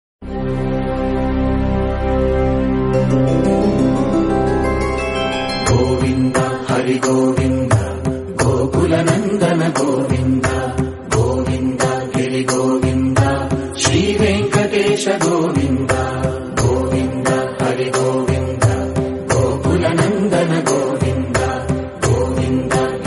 telugu devotional ringtone telugu music ringtone bhakti telugu ringtone